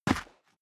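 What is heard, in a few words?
step boots single concrete grit single stair up, footsteps; schritte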